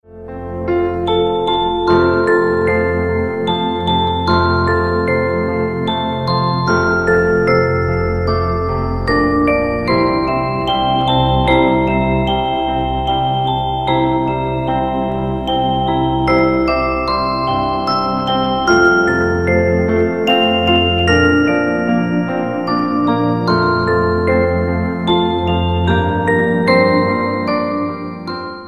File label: soft relax ring